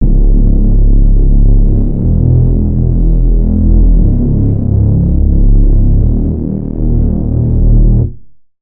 Reese; Illegal Enterprise; Routed Sound; Vital Expansion - Noble Gangster Vol.1

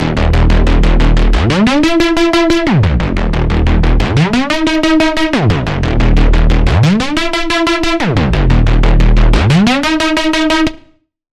Pulse; Tenet; Routed Sound; Vital Expansion - Quantum Enforcer Vol.1

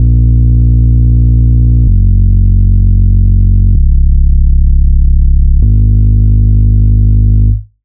Bass Basic Sub, Sylenth1; Routed Sound; Sylenth1 Expansion - Star Scavenger Vol.1

